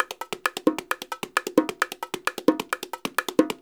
percussion loops 132; Sampleheads; NYC Percussionworks; 90 s Sample CDs

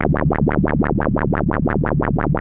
ARP 2600 C#2, synthesizer; Hollywood Edge - Giorgio Moroder Rare Synthesizer Collection

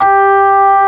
ORG. G4; B3 FULL

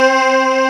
VOICE; East West - Global Sounds; PPGWAVE22

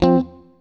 STRAT, GUITARS; Synclavier Sampler Library

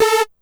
Synth Hit A; Zero-G Trance Inducer; 90 s Sample CDs

